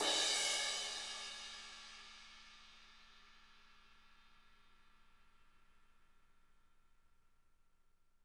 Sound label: CRSH, CRASHES